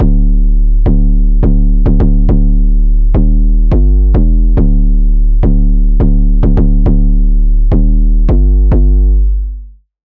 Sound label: 808, Sylenth1; 808 - Cracking Stage; Routed Sound; Sylenth1 Expansion - Street Vanquisher Vol.1